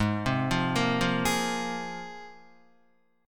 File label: Ab 6th Add 9th Ab, Guitar; Ab6add9 arpeggiated